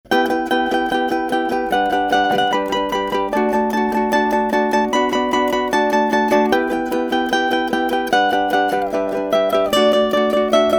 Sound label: Combined Picking, ukulele